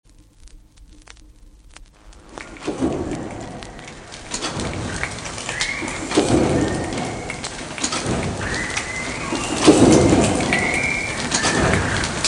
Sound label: Engine room soundtrack